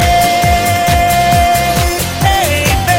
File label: Ringtone